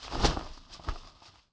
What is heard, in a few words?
sails, sea; AMBIENT; Pirates Of The Caribbean